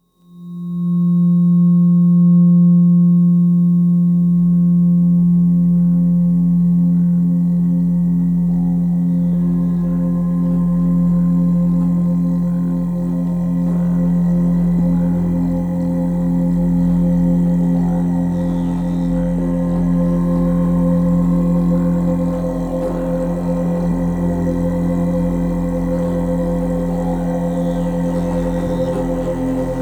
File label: Solfeggio 174 Hz Foundation Frequency